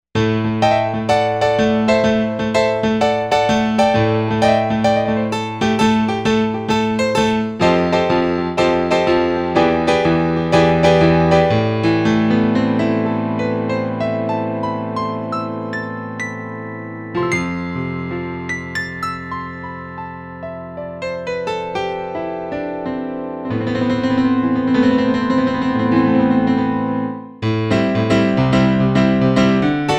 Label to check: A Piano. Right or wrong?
Right